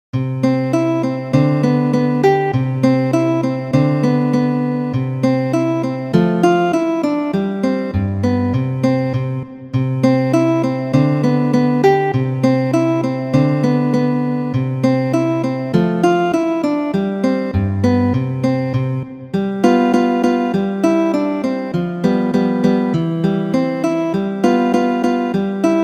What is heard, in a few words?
poco alegreto; Ferdinando Carulli